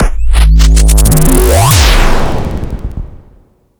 cow mangler over charge; tron mod; weapons

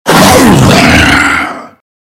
attack launch; slender; amnesia

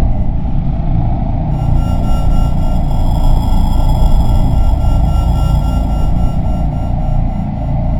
ambience; slender

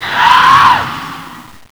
die, horror; slender

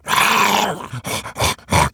pain; slender; zombiebm

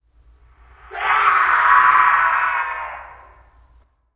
alert far, horror; slender

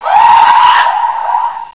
alert far, horror; slender

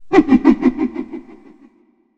new laugh; slender; bendrowned

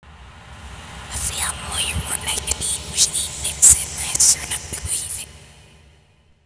reversed; slender

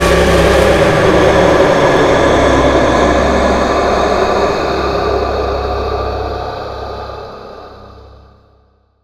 scare; slender; scp-173

